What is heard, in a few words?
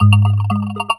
poly beat